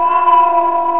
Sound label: scifi